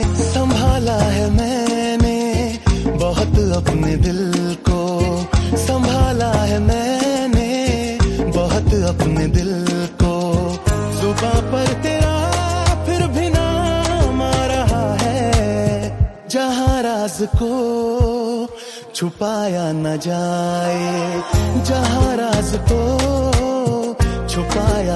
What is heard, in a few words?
Ringtone